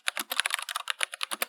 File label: keyboard typing